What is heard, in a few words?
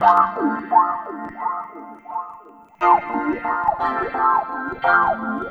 Soulgroove, Funk Keys; Sonic Foundry (Sony Creative Software); Synchro Funk